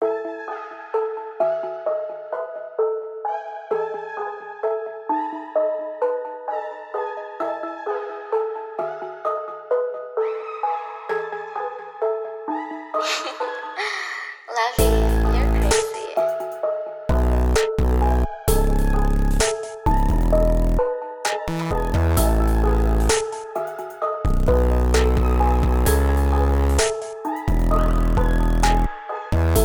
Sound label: glitchcore Type Beat